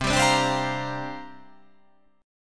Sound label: C 9th Suspended 4th C down